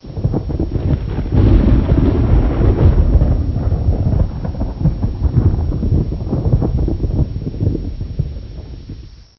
thunder; hl2

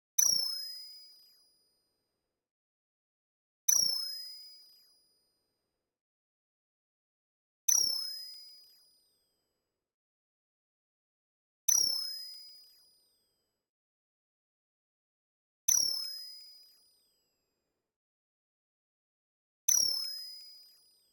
ui Sound Pack Select; Kpow Sounds

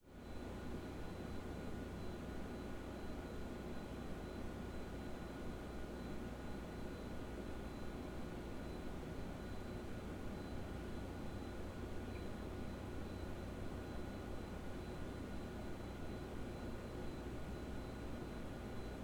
Room Tone Medium Computer Room Fans; 2496SoundEffects; Room Tones and Quiet Places Pack 1

